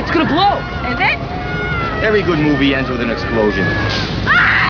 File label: explosion end; montage of the greatest explosions in recorded history; Illegal Aliens, a science fiction film; the film ends as only it could